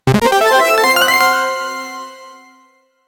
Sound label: game effect for end of, round end